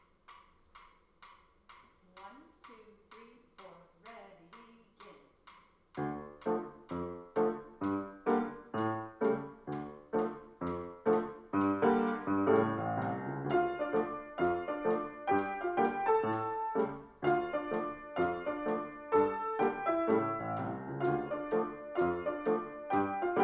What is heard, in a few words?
Shuffle